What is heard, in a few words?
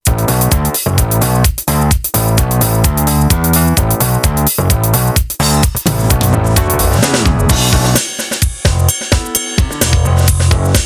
Acid jazz